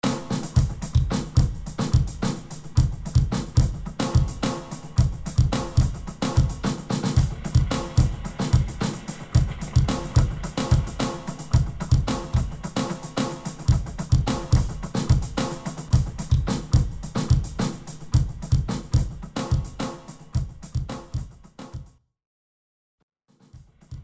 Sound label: 16th Rock Vibe